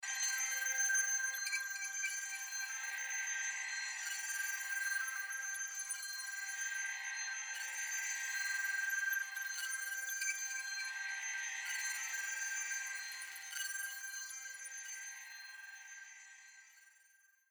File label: Pad